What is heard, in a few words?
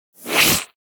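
Electric ball reverse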